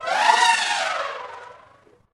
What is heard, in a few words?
trumpet; smite; voicepack; ganesha